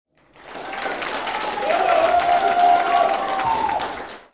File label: cheers